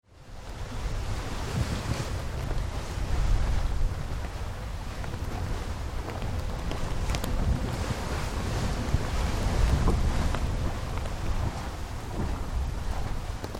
Galleon Tall Ship Under Sail with Rope and Canvas moves; Boat Sound Effects